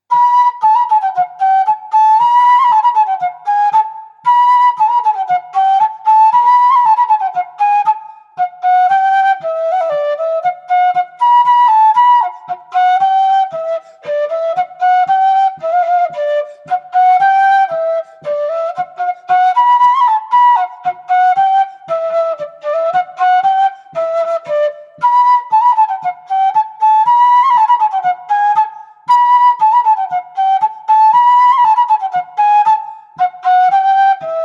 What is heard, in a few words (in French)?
Bourree